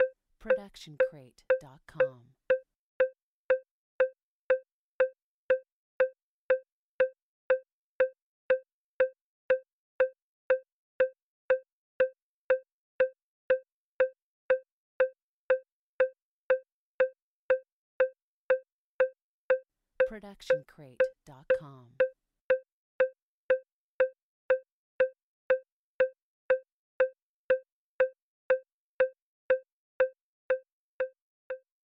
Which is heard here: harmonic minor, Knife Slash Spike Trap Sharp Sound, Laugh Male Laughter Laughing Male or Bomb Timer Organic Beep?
Bomb Timer Organic Beep